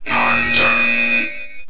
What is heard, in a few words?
blare hunter